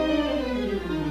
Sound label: restore down; phantom